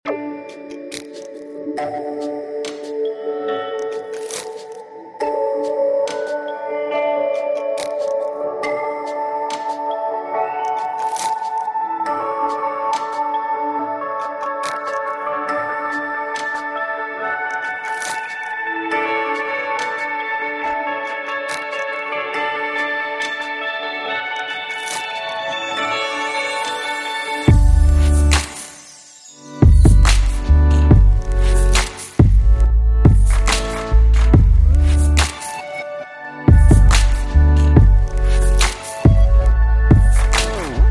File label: Instrumental